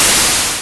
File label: explosion; mice